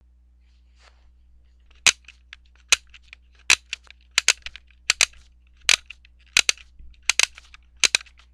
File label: gunshoot sound